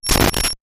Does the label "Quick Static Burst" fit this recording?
Yes